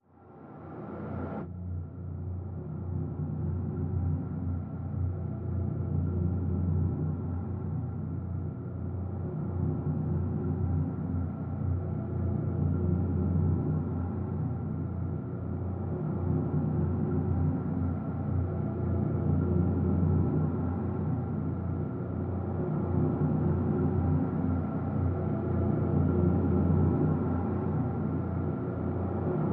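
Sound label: drone simple E; Drn Bleeding Out Simple E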